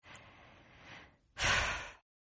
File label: Sigh Female; Exhaling; Voice; Partners In Rhyme